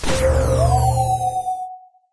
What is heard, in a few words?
Ufo Lift Off; candy crush saga gamerip